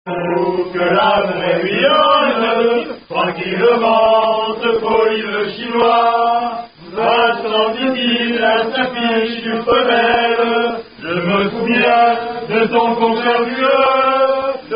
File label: Unknown French Rugby Song; Lecoach